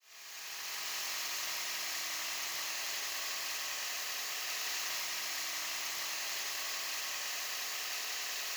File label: Echo HPF 1500 12dB